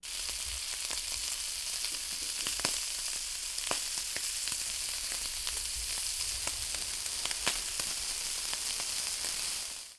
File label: Stir fry rice; anemoi